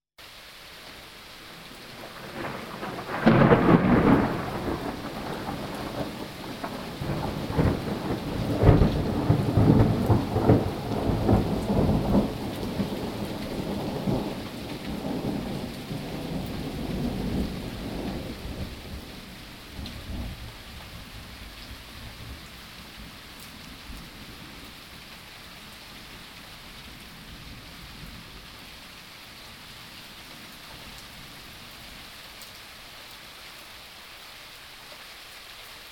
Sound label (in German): donner, natur